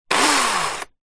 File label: industrial chainsaw electric very short burst